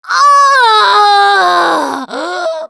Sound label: Hurt Critical, russian